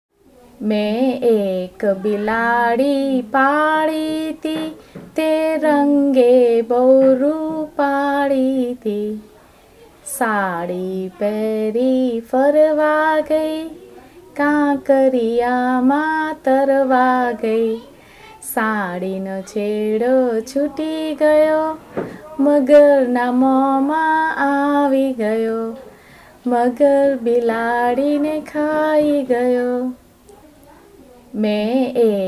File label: the cat song